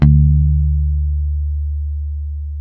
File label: D 3, hip-hop, bass; Spectrasonics; Bass Legends CD 1